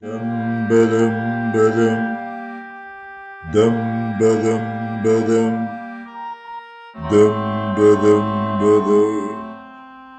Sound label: Les 4 Saisons Ete Alto; Alti